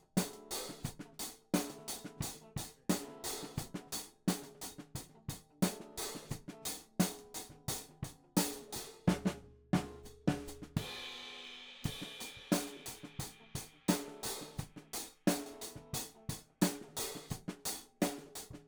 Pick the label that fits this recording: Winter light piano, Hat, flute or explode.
Hat